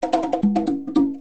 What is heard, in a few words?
conga synthesis